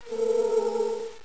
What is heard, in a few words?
Soul Barrier